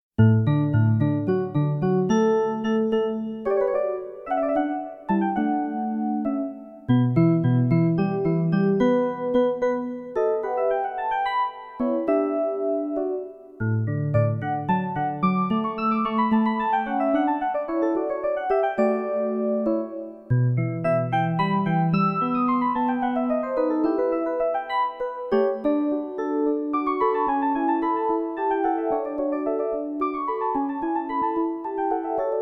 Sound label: Piano Sonata In D K576 3rd Movement; W.A.Mozart